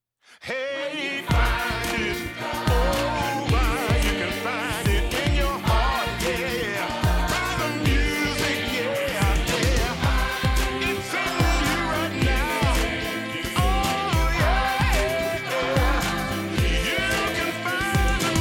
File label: Bass Solo; My Little Pony Friendship is Magic